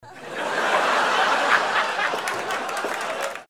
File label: laugh applause